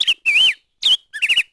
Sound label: bird; DAoC